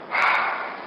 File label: breathing